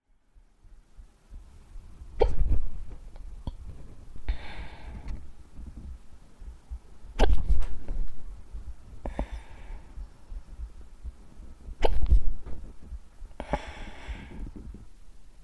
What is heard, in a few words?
soundscape